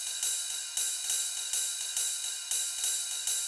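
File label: open RIDE, Techno 138 BPM; Best Service ProSamples vol.54; AKAI; DAVE CEE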